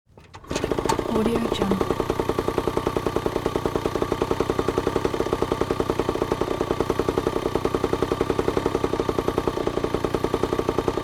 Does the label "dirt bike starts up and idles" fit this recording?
Yes